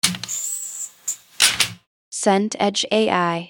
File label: door hatch seal